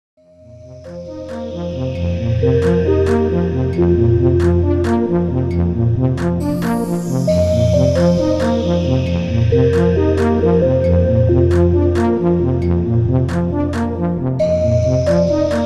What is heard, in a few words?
OP-1 Sample